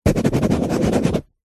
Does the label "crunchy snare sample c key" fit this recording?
No